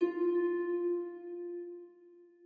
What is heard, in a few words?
harp